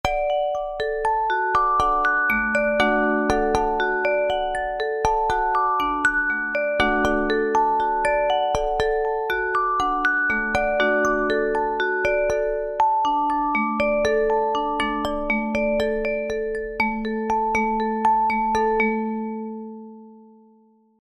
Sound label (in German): Dreiklang